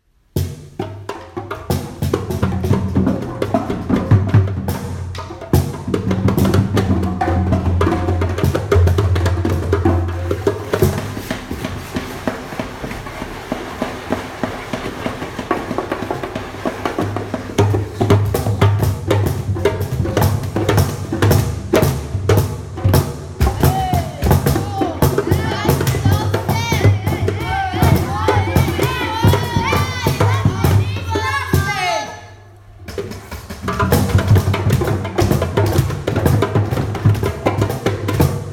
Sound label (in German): Sommer Musik mit Trommeln